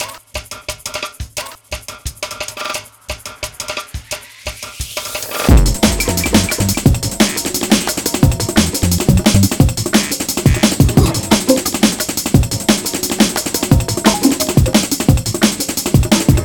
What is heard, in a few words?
Junglepot Drum And Bass